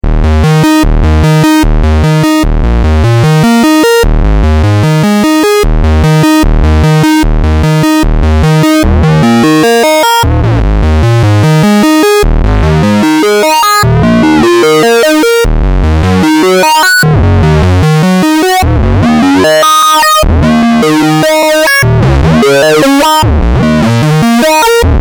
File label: JP8 Sync Arpeggio